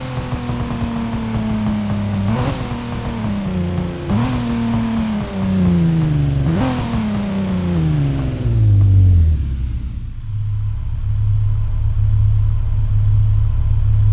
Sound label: throttle off, vehicles; sgmcars; lancer